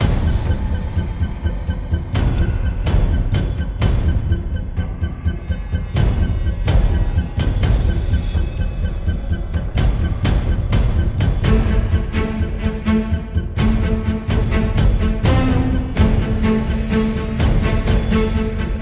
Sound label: sounds music; Halo Combat Evolved; Xbox